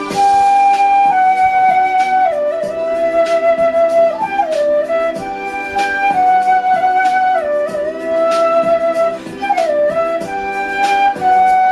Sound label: Instrumental Flute Ringtone